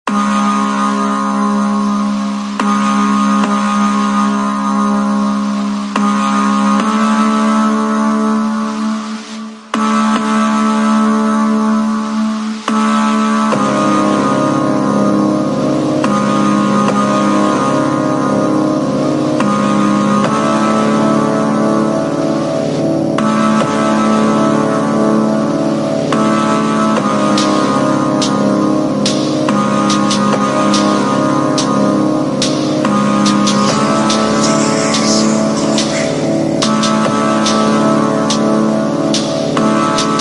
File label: super slowed